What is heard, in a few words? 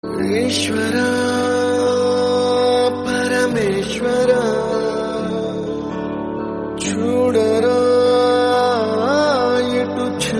telugu song; uppena